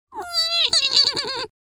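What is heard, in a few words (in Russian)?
жуткое маленькое существо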